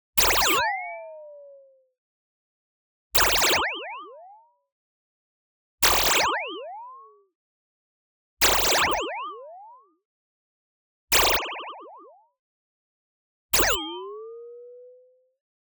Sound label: zap, metal synth impact warp fast; boom library; Anime Essentials; DSGNMisc